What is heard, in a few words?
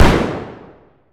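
weapon lmg fire player atmo int; gmod; viper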